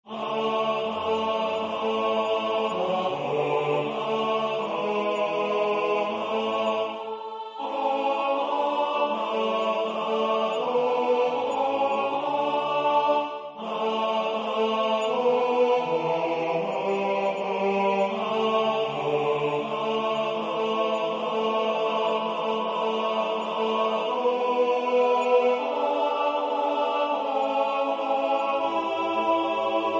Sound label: tenor; Waldesnacht